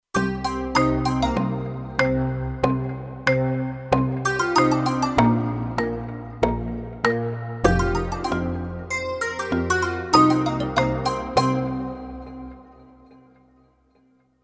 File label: Perc Stack, Kurzweil K2500X